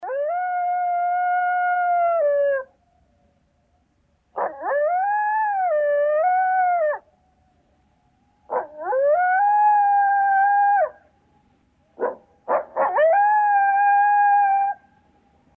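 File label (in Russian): вой койота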